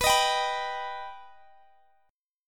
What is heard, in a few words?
B Augmented B down Guitar Half Step Down